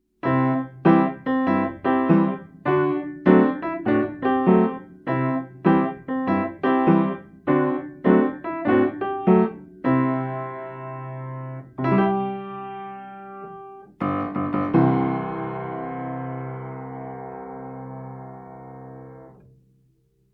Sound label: theme piano